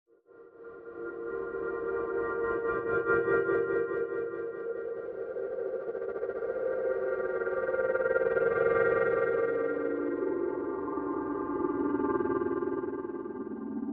Alert And Waiting Lead Motif Only

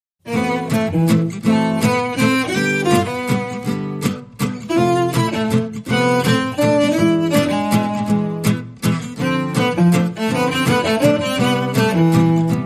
Bal Musette Waltz, Guitars and Lead Strings Only

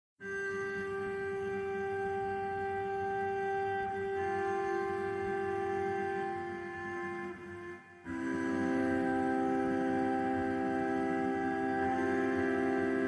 Cello Only

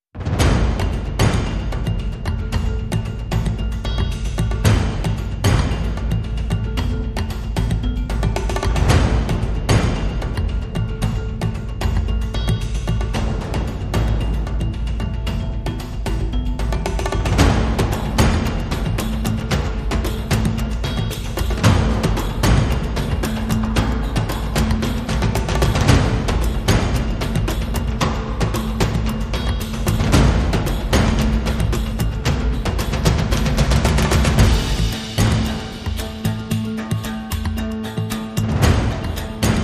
No Flute

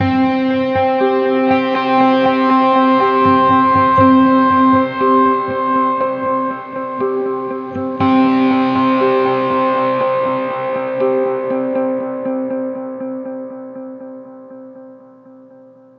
Spark Of Opportunity, No Drums

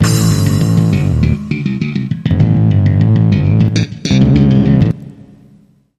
Funky groove

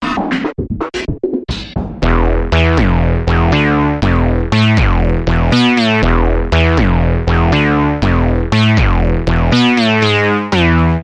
Electronic Bumper Reversed

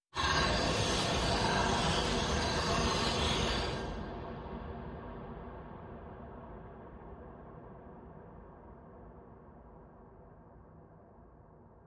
Scream SFX Only

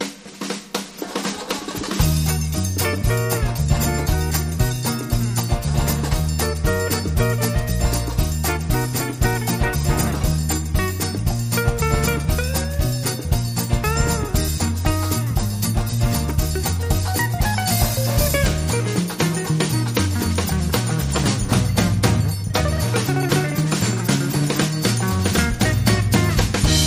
Swing

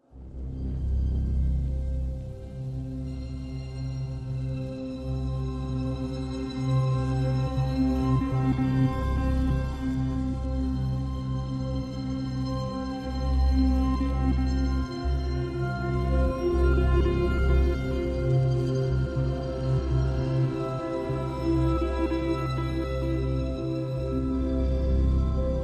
Piano

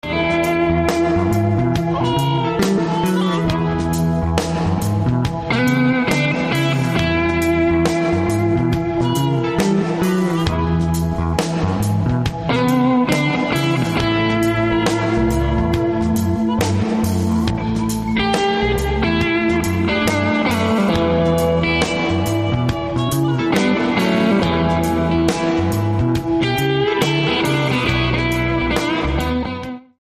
Cool Blues

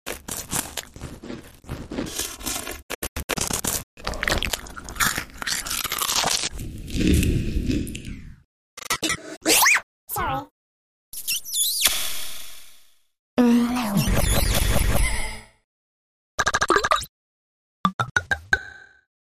Snacking Fairy Sneeze Fairy Kiss Hm Now Aqua Pour

